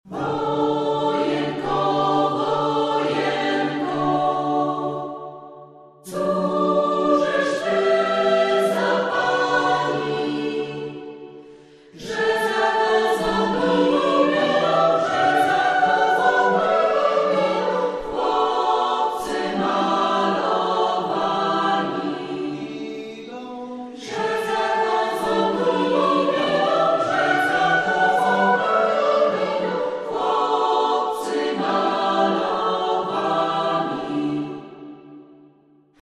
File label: acapp